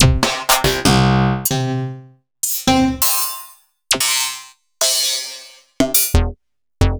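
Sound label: Roland MV8800 drum lectro kit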